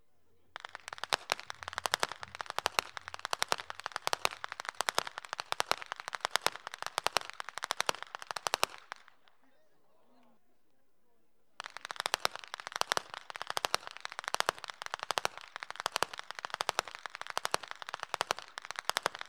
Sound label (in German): schnalzen; stoiss; jugend